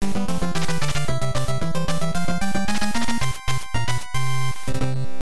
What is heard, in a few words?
Battle Mode Win; Choujin Sentai Jetman; nes